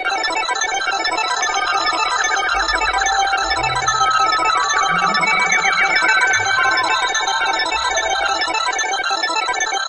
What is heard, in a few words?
reels